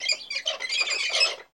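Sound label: Cockatoo Roseate Call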